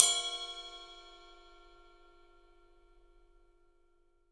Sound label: ride cymbal; 3D Sound; 3D Drums